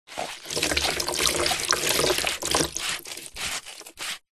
household mop wet turn handle rinse drip drain plastic bucket